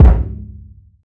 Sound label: warm kick one shot c sharp key